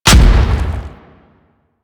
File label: Thumper Bot Step Heavy E; Warframe; Grineer